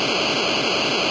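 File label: sound thrust; arcade-canvas; defender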